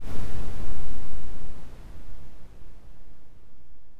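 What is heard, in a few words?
thunder, Nature; 2s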